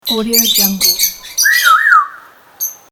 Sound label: the parrots